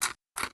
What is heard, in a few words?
eat